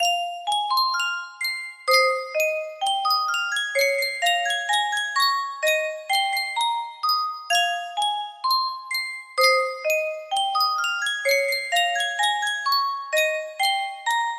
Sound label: Sankyo music box, music box melody